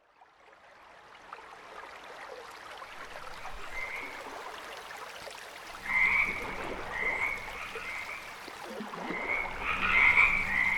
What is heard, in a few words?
Frog Gigging Full Scene; Bright Star